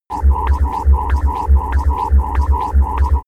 sci-fi machinery

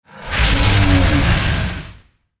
monster moan

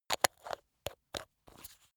glass bottle close screw

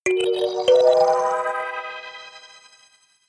notification kalimba magical fourth up

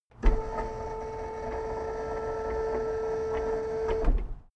car lift up

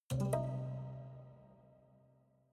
haunted strings chord minor damped

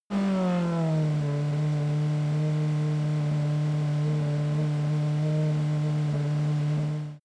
racing car f1 engine decelerate idle

